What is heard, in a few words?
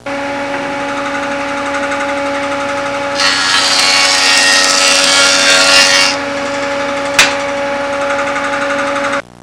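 electric saw; HAUSHALT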